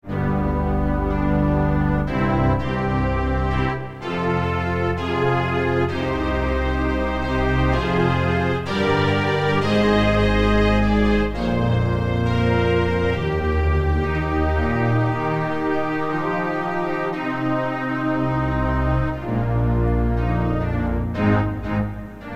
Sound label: strings